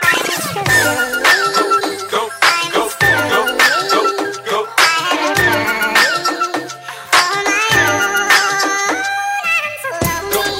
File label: awesome fusion ring; hip hop